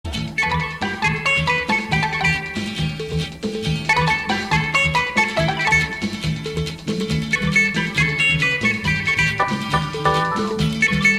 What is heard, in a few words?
Ring Tone